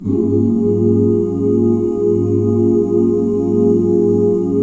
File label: AB SUS13 OO, ooo chords; Spectrasonics; Vocal Planet; Jazz FX